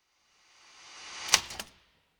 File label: evil typewriter single clicks snaps; prosoundfx